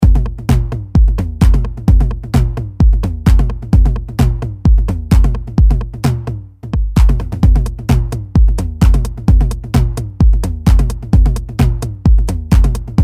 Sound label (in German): TR-909 pur